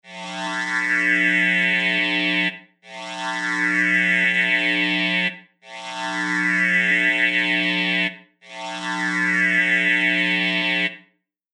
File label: Electric Swell Multiple, Repeated swelling electric buzz; Repeated swelling electric buzz oscillations.; Electrical Sound Effects